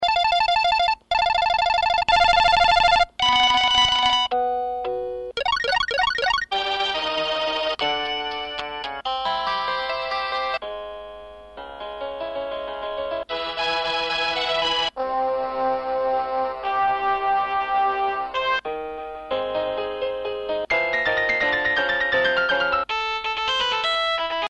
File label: phone; panasonic